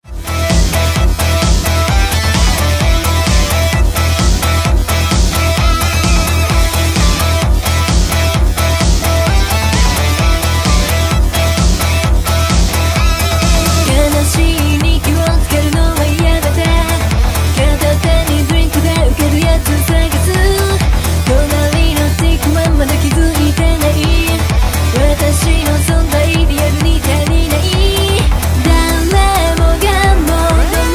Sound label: Synth Wave